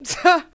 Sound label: Vocals, Adlibs; Houseworx